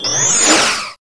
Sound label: phaser; Weapons